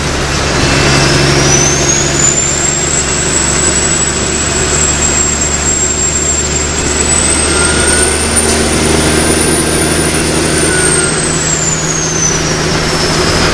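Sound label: fourth cruise, car engine; tdmcars; kwt800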